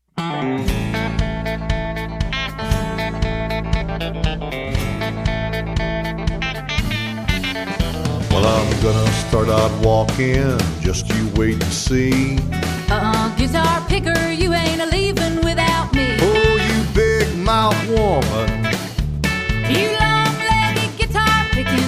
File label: Guitar Pickin